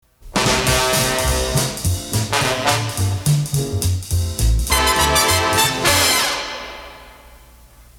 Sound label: Instrumental; Sing to Swingin; CKWX; 1961; Anstey Collection